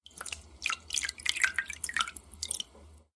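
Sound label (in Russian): вода поток спокойный высокий мягкий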